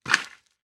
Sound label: Impact Torch Drop On Sand